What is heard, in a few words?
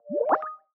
Confirmation; Skype Sounds